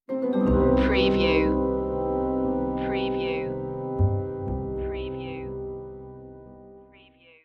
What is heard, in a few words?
silent noir stinger